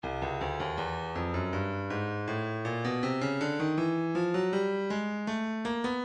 breakbeat midi files 080 084 bpm; Stylus; modren a

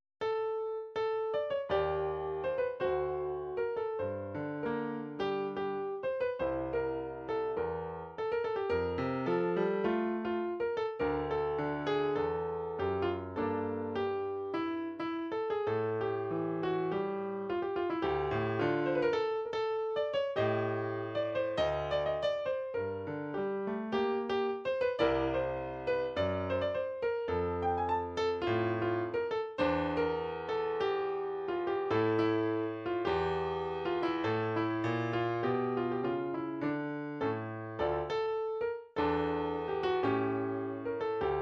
tango, midi

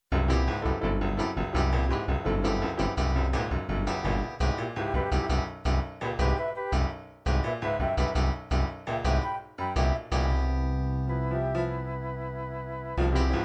latin carimbo

